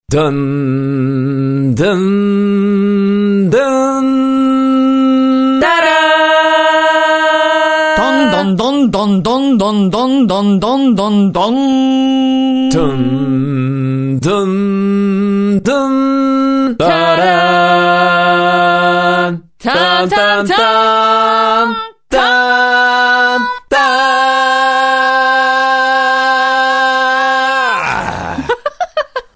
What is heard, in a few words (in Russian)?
iphone 6 i iphone 6 plus duet